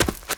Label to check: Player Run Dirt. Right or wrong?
Right